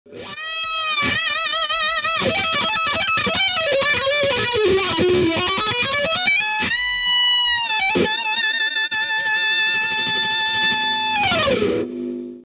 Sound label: fulltone clyde wah standard, effector wah